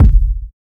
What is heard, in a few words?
small reverb kick single shot g key